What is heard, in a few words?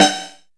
Shock Snares, Percussion; Best Service ProSamples vol.37; Dance Synths